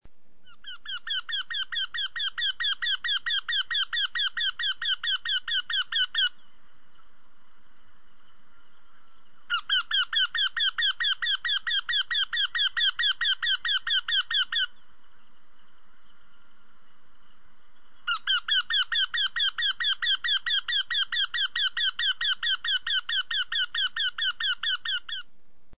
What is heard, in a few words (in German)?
wendehals